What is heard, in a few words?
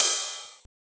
Ride; FLPRO